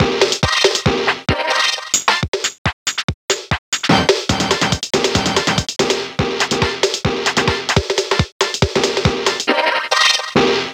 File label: Casio Rapman